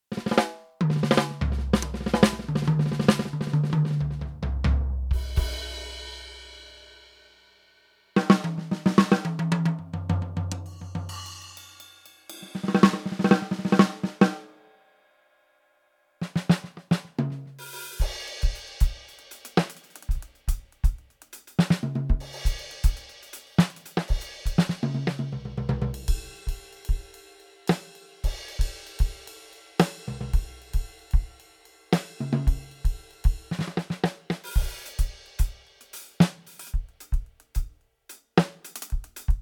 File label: EFNOTE Mini 6 Mellow Kit